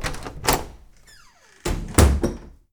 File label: door wood medium; DeadFright